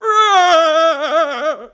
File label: na aaa long